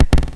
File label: tile caught